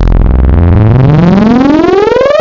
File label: Hardsync; Synthetic's Serum Collection; XLNT-Skrilla Tables